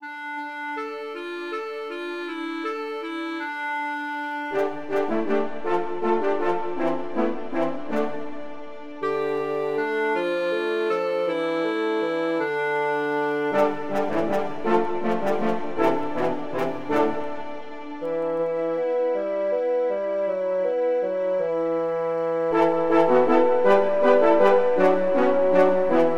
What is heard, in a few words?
Ancient, clairnet and trumpets, Film music